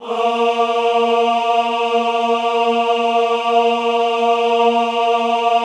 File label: AHH A2, choir; Best Service; Extended Classical Choir; AHH MIX